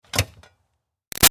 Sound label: Car Highlander Gas Door Close; 2005 Toyota Highlander V6; Cars; Vehicles; Big Room Sound